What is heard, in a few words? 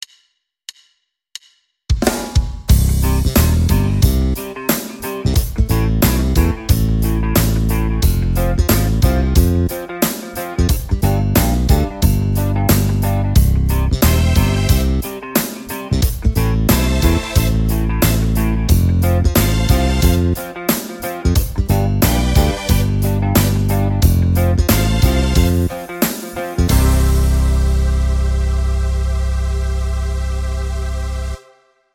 Chord Exercise; Chord Exercise 2B